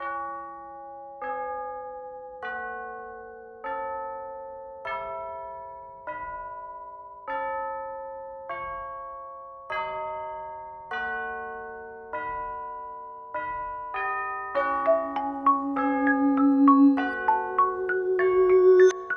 Marimba, Vibes, Chimes and Bells

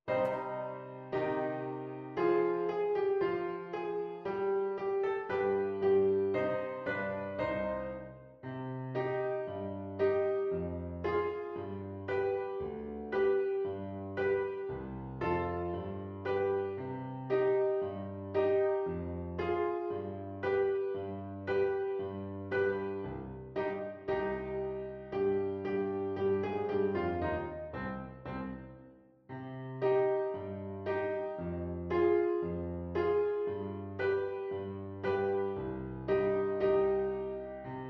piano

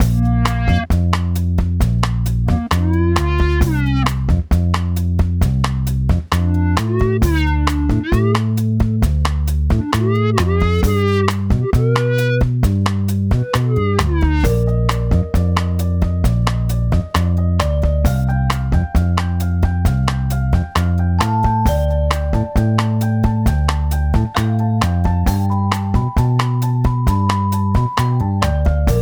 Bumble Bee Bossa

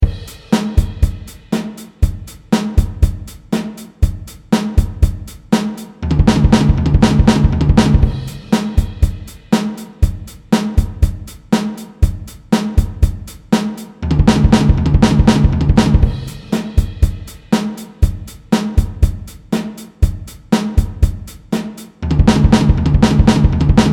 Fill 120BPM; Fill Dev 21 Part Three

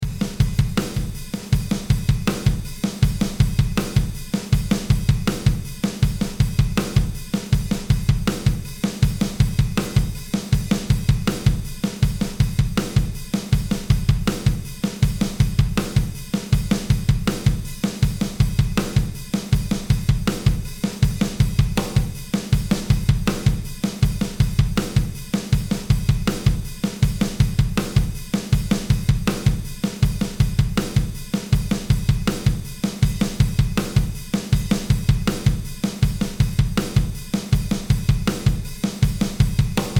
Paradiddle Beat 80BPM, drums; Step Four

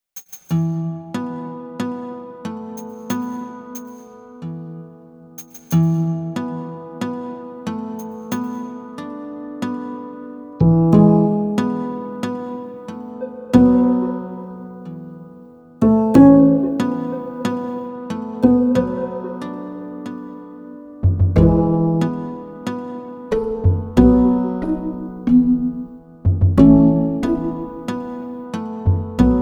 Beachhead, Ambient; Panoramic

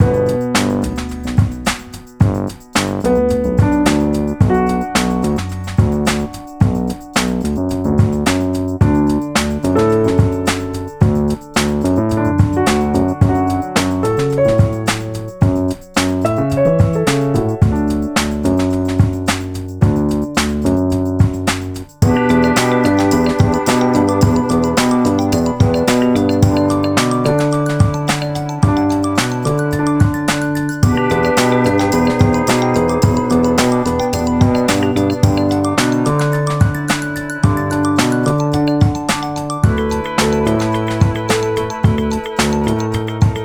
Piano And Fuzzbass Easy; Joyful

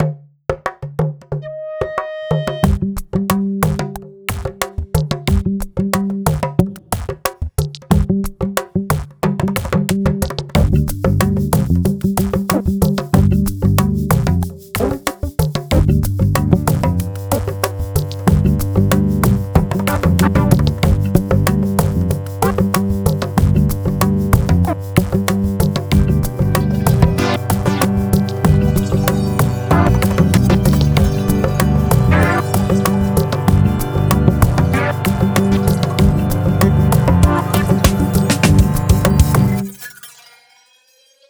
Mute Groove; Equatorial

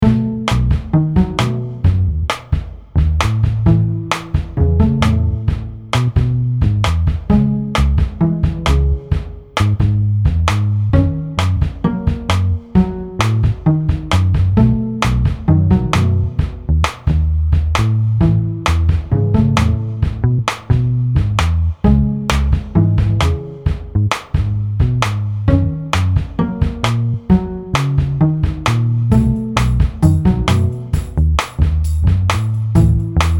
Has Pluck; Backbeat

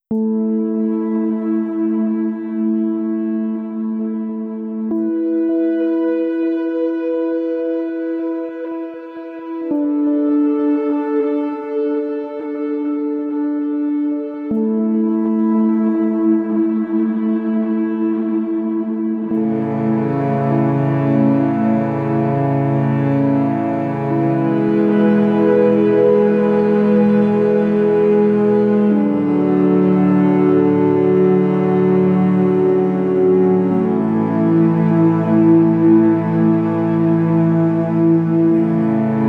Rhythm and Strings

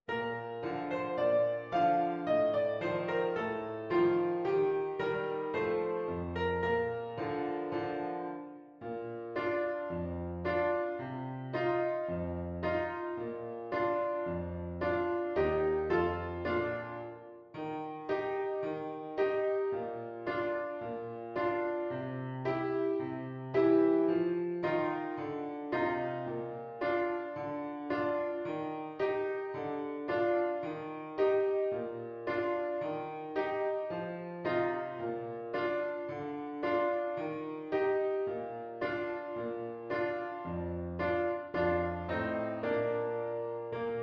intermezzo piano

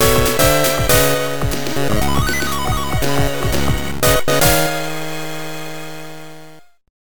Vs BGM; Sonic Advance 3

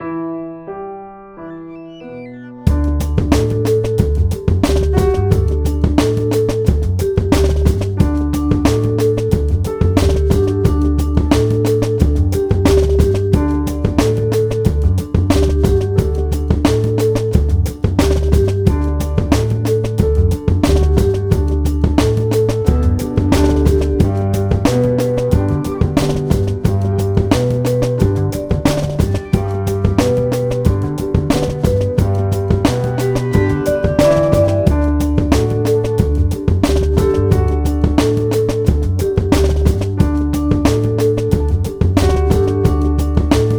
Laid Back Fuzz

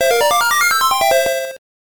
Vs Success; Sonic Advance 3

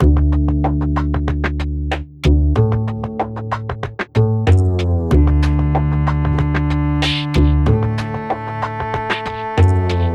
String Swell; Rhythm and Strings

